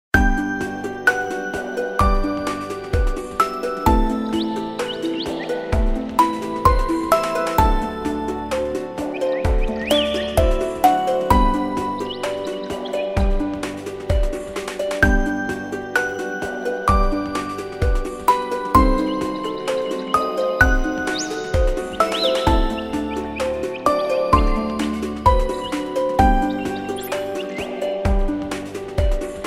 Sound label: ringtong; Gionee GN205